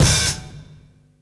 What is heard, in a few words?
Bass & Hi Hat, Loops